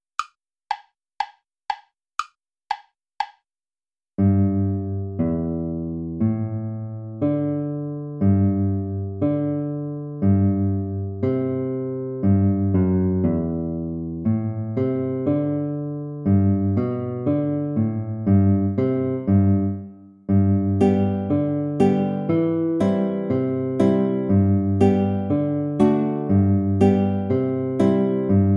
the belfast hornpipe play along

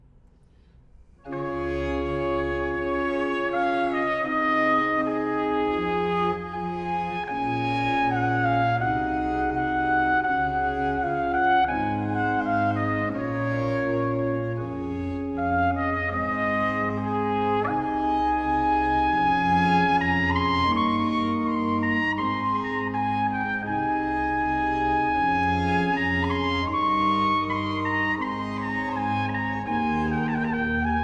adagio baroque natural trumpet; telemann georg philipp

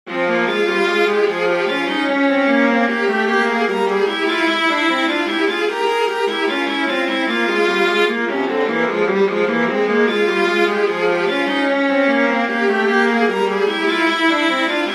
invention f minor for viola duet; Johann Sebastian Bach

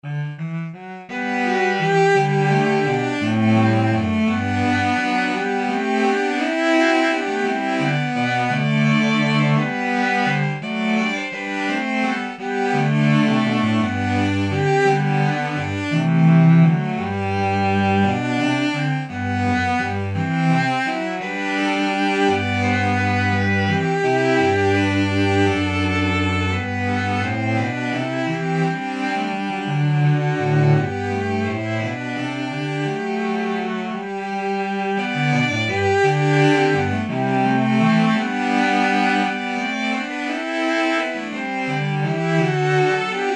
courante

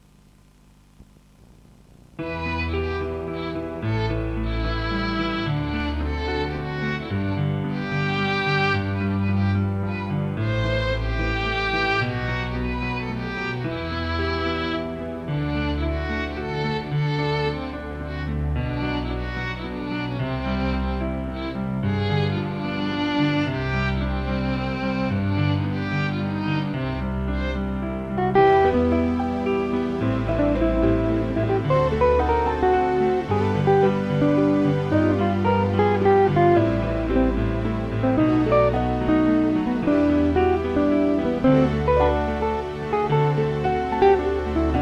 mazurka

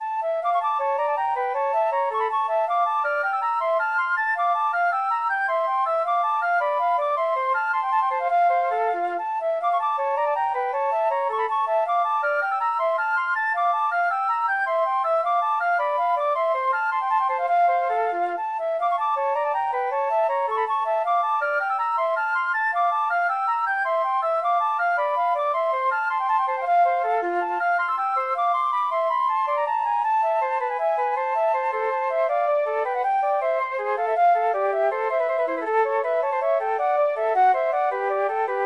for flute duet

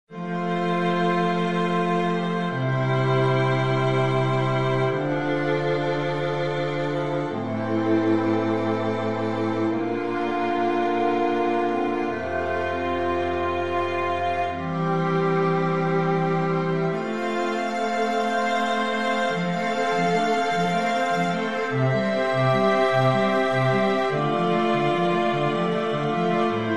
simple pieces of music for string quartet

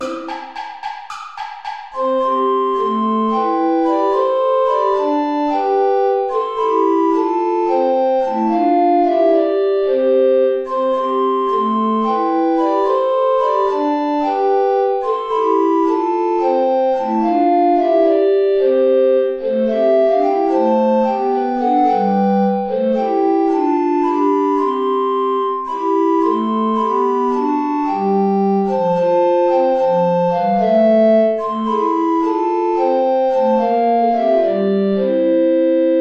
play along without alto recorder

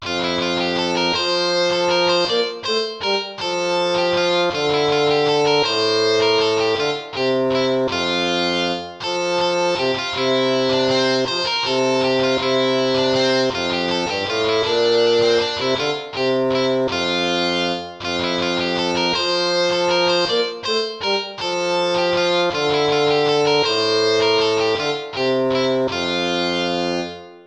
lute

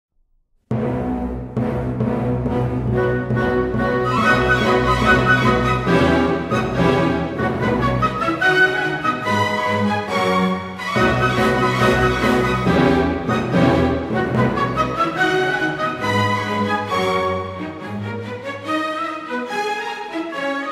dance clowns; mendelssohn bartholdy felix

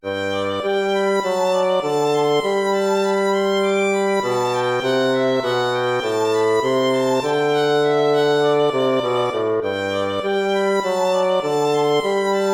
allegro moderato; hook james

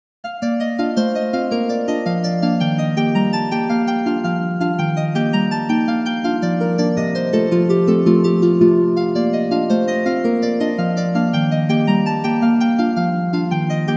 jig; roger

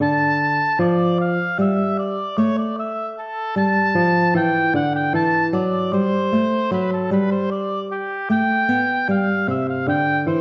air, hornpipe

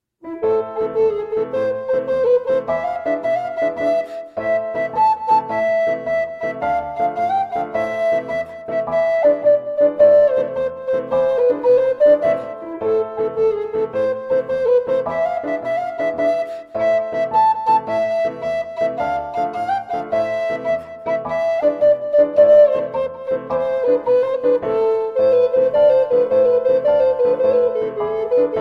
tarantella napoletana; traditional

